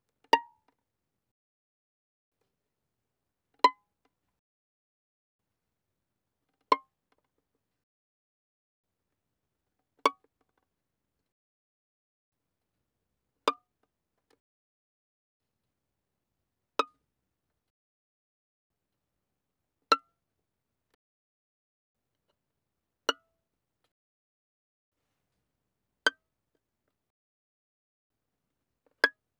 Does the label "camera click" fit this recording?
No